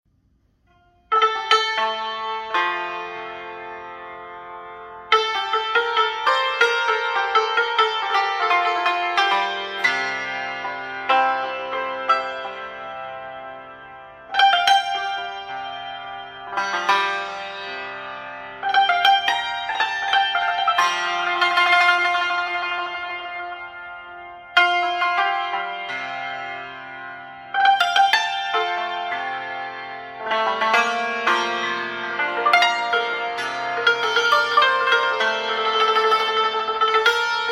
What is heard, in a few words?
Santour